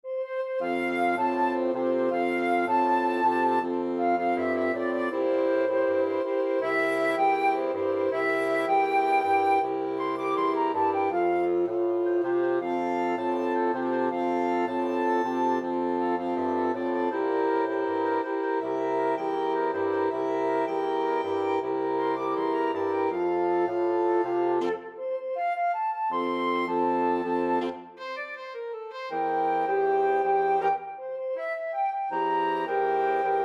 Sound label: flexible mixed ensemble 5 players